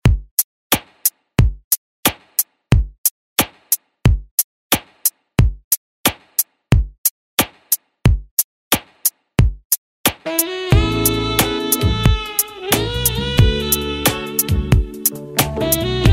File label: 90s 90bpm